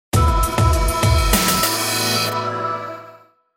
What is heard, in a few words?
dub beat